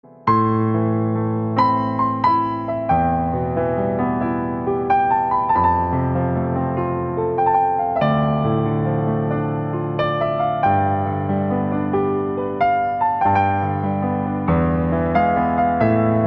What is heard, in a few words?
Instrumental Ringtone